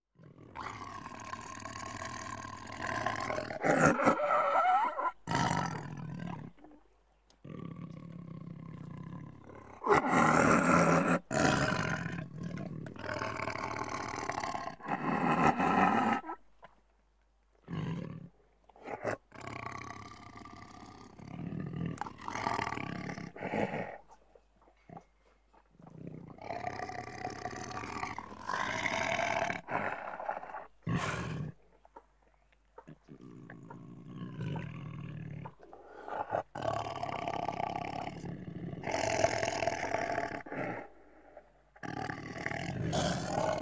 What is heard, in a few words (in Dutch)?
Tijger - Gegrom; KMP Film Video Sound Effects